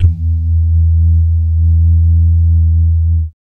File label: BASS DUMMS, Vocal Planet Jazz FX; Spectrasonics